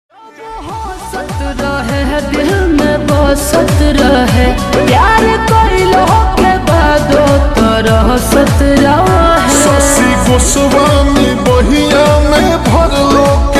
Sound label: Slowed Reverb Ringtone